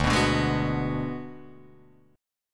D 6th Flat 5th D down Guitar Drop D, chord

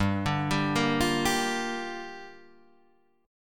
G Minor 7th, Guitar, Half Step Down

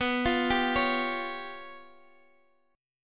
C sharp 7th Flat 5th B

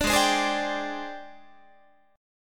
G sharp Major 7th Flat 5th Guitar; G#M7b5 Chord

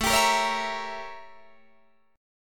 A 7th Flat 9th Guitar Open D

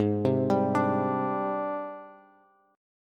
Ab Major Sharp 11th Ab, Bass Bass Standard; AbM#11; arpeggiated; chordshape; 16,15,17,19; JGuitar

